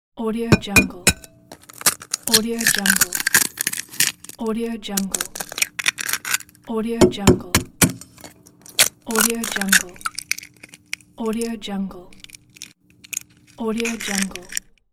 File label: eggs cracking into a bowl